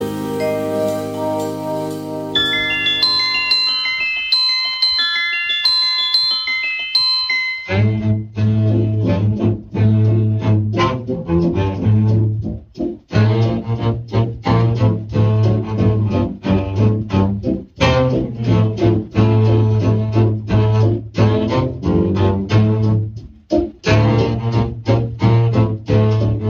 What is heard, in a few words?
Quintet